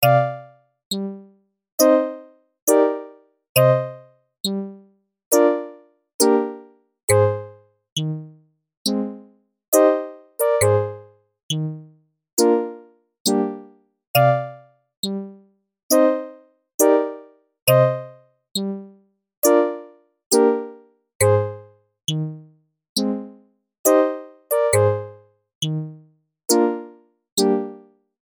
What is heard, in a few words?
orginal dry